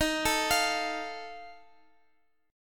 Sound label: D sharp Suspended 2nd Flat 5th D sharp Guitar; arpeggiated; JGuitar